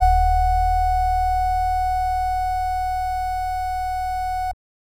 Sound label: Flatline